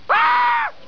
AHHH

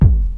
kick; cubase; ZXFX

